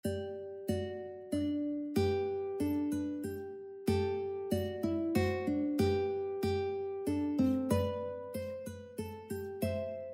ukebook minuet; bach

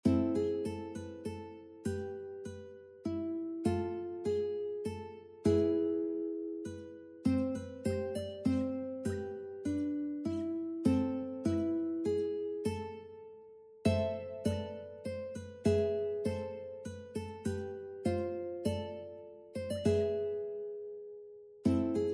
uke minuet; purcell

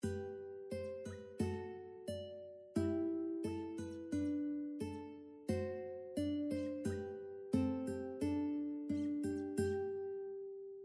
duet ukulele gavotte; Gavotte by George Frideric Handel; classical ukulele fingerpicking duets

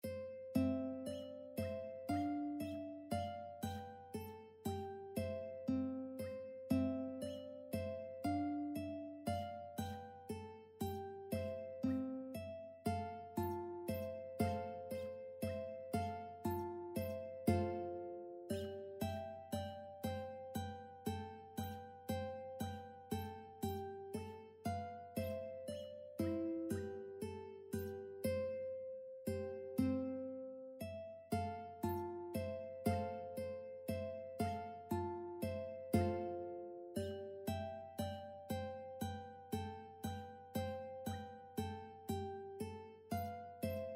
duet ukulele menuet; Menuet 1 by Christoph Graupner; classical ukulele fingerpicking duets

